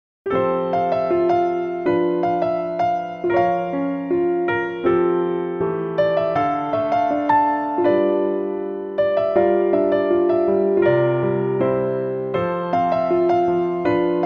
Piano Version; Imouto no Katachi Original Sound Track

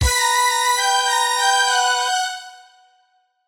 Hurry; Scarab

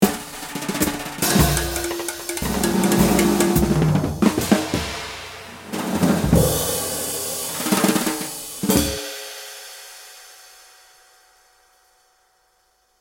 DRUMS SOLO; The Many Pieces of Mr.Coo

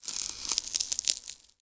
thin ice long; Scrat

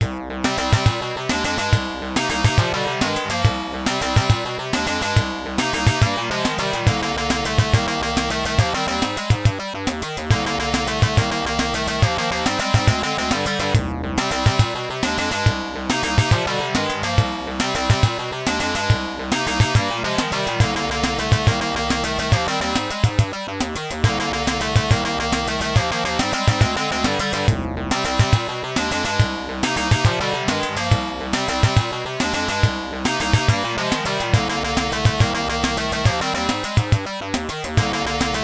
FM Sound Source; Hydefos

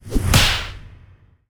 whiplash; hero cronan

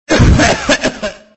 Choke Sound; Extra Lives

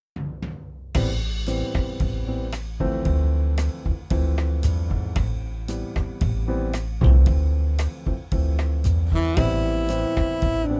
Bossa

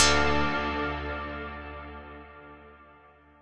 Hits

